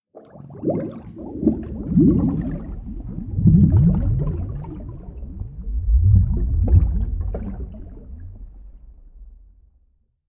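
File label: sounds of a drowning person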